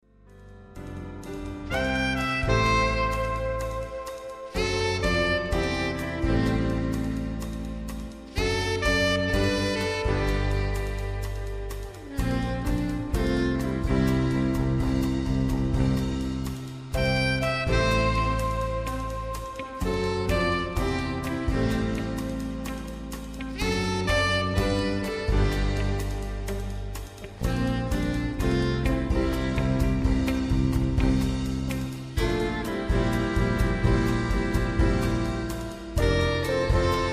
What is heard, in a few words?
Where Are You Sax